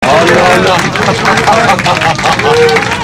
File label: plane; homelander